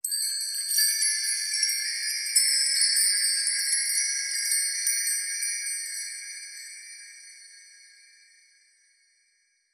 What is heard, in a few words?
Magic Cheer Long Chimes